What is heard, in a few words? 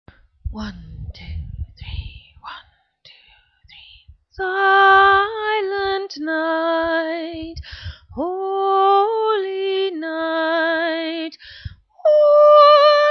Soprano